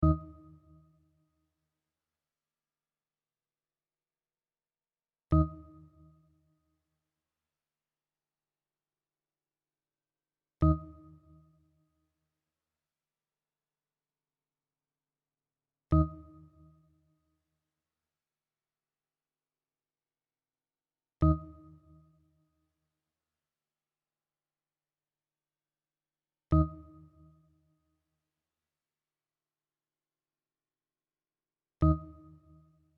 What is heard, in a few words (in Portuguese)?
amostra de pulsos com periodicidade